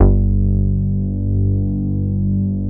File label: MOOG BASS G2; Best Service Dream Experience